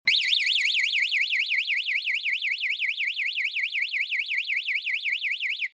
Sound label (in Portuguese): SIRENE ALARME DE INCENDIO